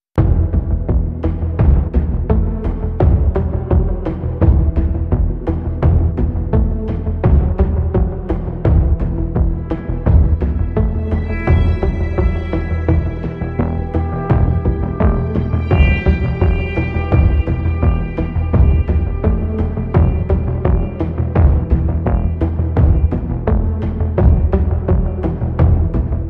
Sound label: Less Synth